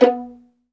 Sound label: STR 2 PIZZ B3, String Vlns Pizz; Roland - String Master Series; Vls Pizz